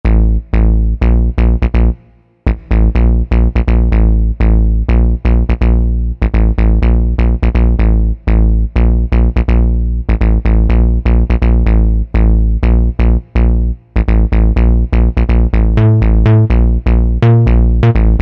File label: GRUFF BASS; Best Service Alpha Dance II